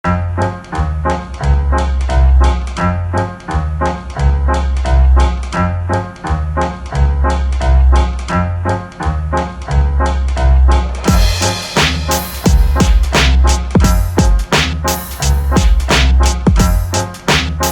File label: Boombap Instrumental